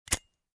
Lighter switched on

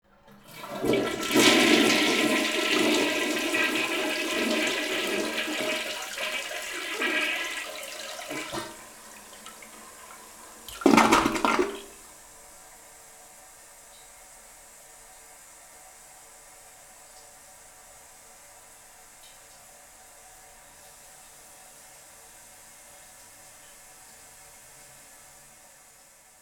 Toilet flush and clocked toilet

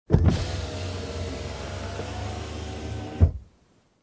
Car window down lift

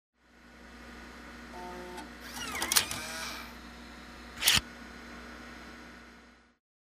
Computer Disc eject

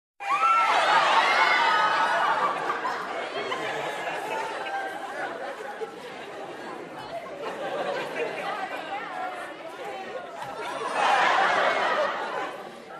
Crowd Shouting and Laughing

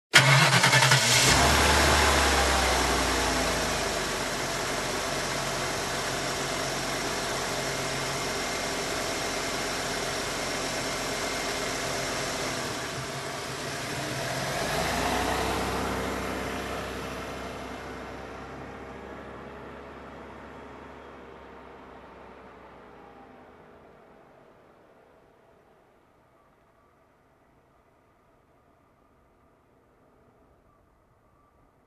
Truck engine ignition and drive away

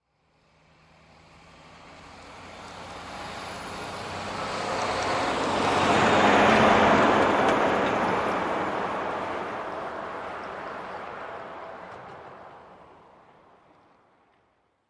SUV passing by

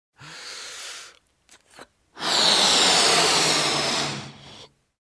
Inflating balloon long

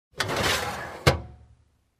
Metal drawer opens

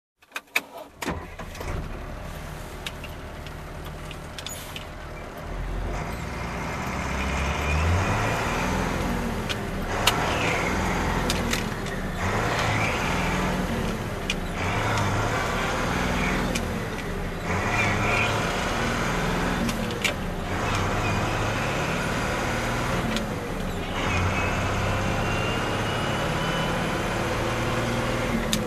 Garbage truck dumping

